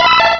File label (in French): Cri